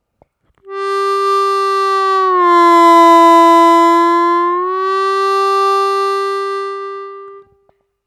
Бенд на втором отверстии, губной гармошке; На втором отверстии плавно понижать ноту до самого дна, возвращаясь затем на чистую ноту

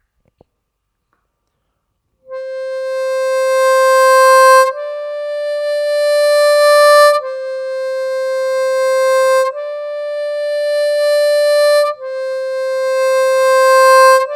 +4-4, губной гармошке; одиночными нотами

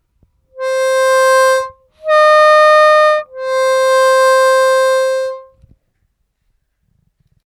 +4+4o+4, овербенд, губной гармошке

